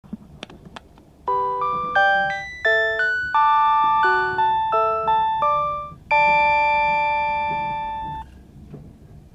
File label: chime; higashinihon; hokutosei